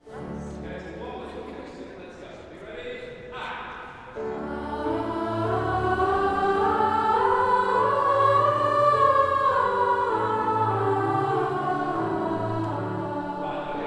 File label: WARM UP, voices